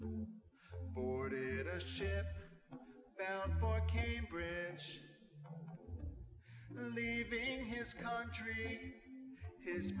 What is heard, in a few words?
nodrums inst